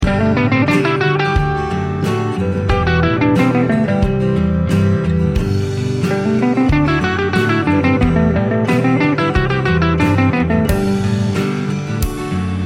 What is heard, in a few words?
G major example